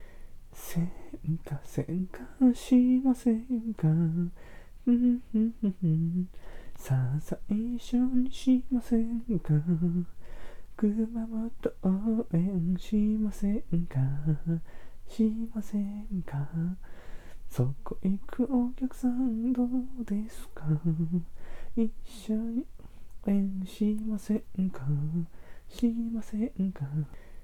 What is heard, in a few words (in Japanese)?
しませんか♪熊本ver 民謡風; 馬のオネエさん